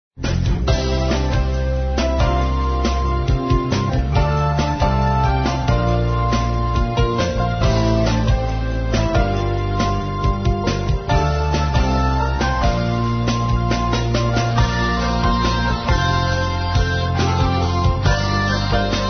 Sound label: pop rock